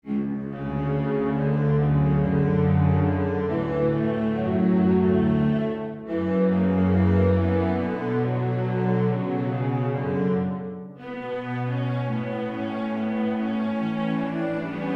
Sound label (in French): musique de chambre